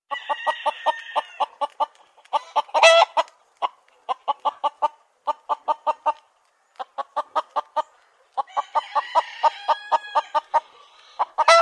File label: Chickens Cluck Crow H